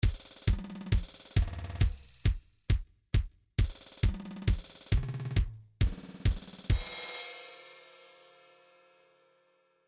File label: trance midi loops beats energy; Newtronic Trance Constuction Kit